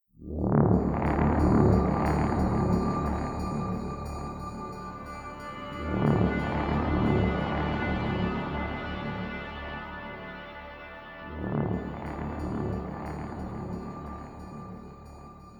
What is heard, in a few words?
Stinger Pulsating Long; Execsearch